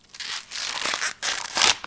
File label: Tear Corn Husk; SFX Kit; Foley - Creaks